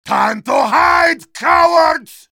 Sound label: yell; heavy